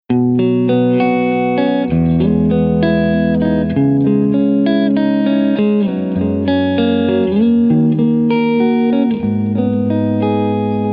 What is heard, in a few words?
Ibanez S470 Keeley Compressor Tech21 Boost DLA Tap Tempo Fender Pro Reverb